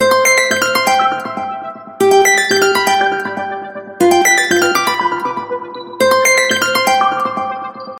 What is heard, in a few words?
Mystic Harp, ringtones; Huawei C8813D